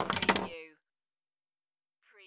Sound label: Pinball sound, ball land roll and touch metal objects